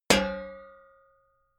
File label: sheet ring; hits impacts